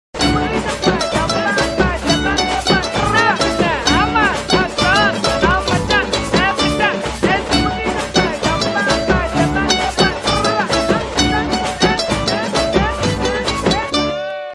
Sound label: movie bgm ringtone, movie song bgm ringtone; jathi ratnalu